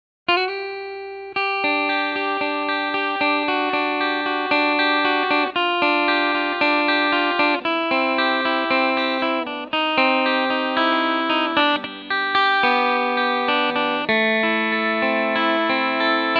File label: Nash Electric